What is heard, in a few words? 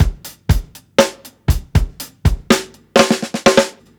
120 HALF TIME FILL, DRUMS; LOOPS; BASEMENT DRUMS VOL 2